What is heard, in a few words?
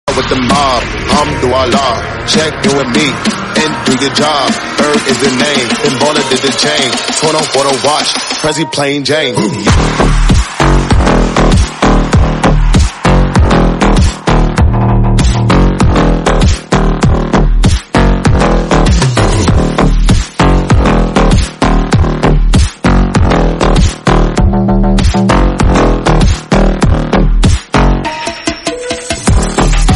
iphone